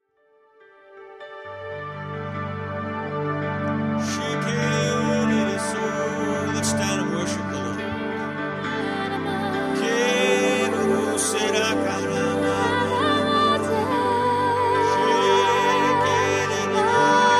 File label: Singing In The Spirit